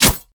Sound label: Attack spear Front; Whistlers